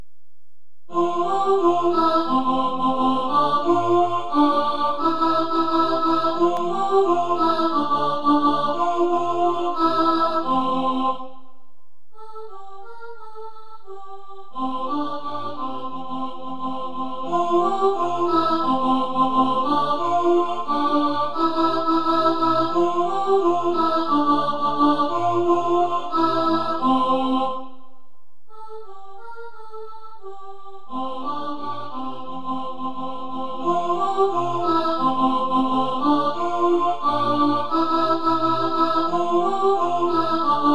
alto, choir

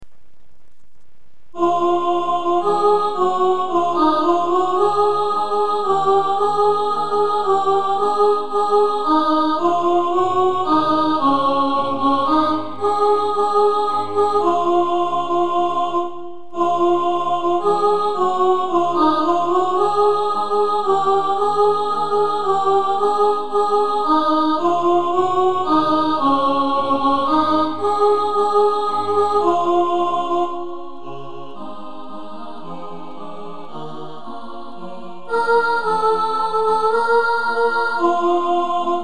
ubers gebirg sop2, choir

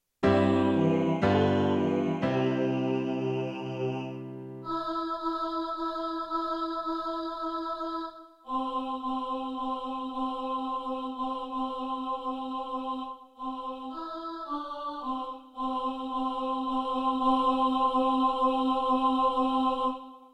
chorus tenor

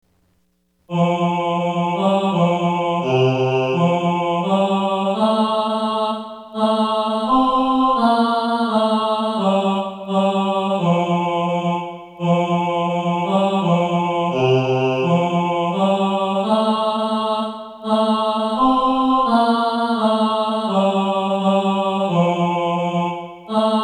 bass

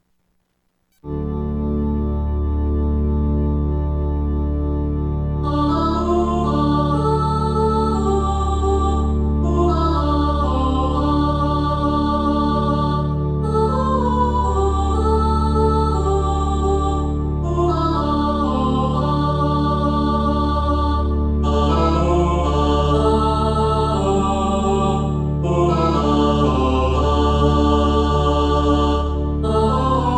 Drum

